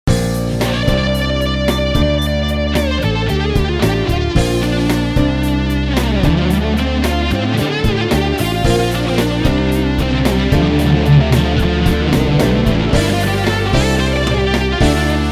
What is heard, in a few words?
60s fuzz